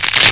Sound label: SNAP